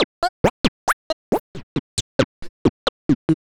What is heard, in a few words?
Vox FX